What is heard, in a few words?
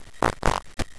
fart